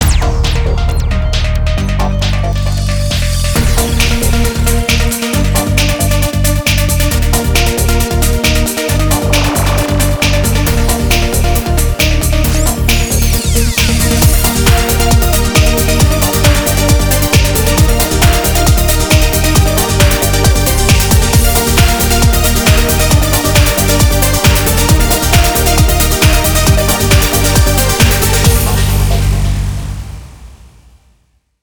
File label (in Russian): Музыка для Рекламы; TVC Music 30'